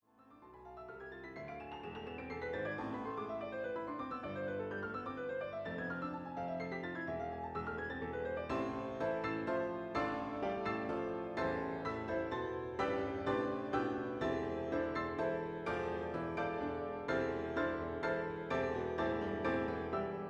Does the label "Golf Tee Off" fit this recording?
No